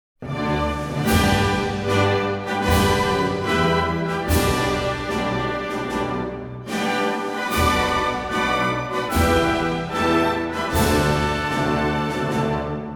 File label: papua new guinea, national anthem